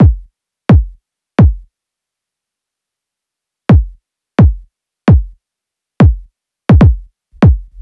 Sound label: Kick; LANDR Medium